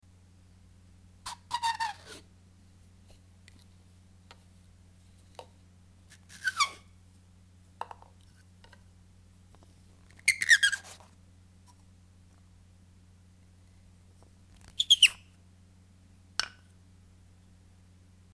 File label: russian dolls opening